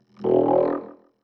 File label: Neo-Wave Vocoder, Pop Rock